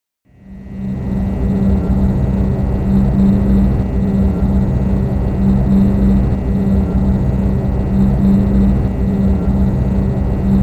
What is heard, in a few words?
Sky Noah Stage (AMBIENT); King of Fighters XIII; PS3 version